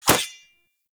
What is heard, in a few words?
Rising Blades Sword Impact; Warframe; PaxDuviricus